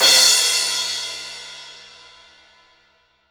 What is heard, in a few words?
DRY KIT; DRY KIT#2